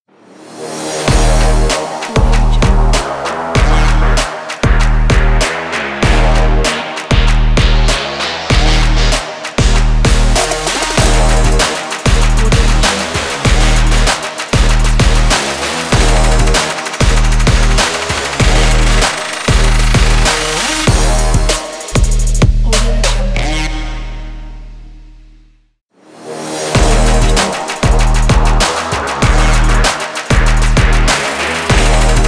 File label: action sport trap ident